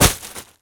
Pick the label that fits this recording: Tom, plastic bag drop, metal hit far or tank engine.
plastic bag drop